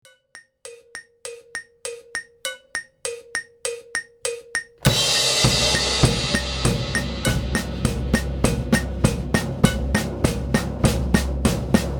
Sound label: Drum